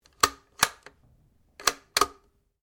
RIFLE ANSCHUTZ 67MSR 22 CALIBRE BOLT ACTION SEQUENCE; A selection of domesttic firearms; Rifles